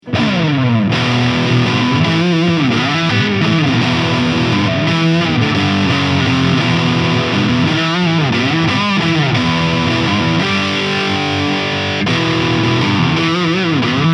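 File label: Epiphone 1961 Les Paul Lead, guitar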